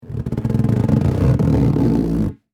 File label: Exercise Ball, Sports, Handle, Large; Stress; Miscellaneous Sport; BlastWave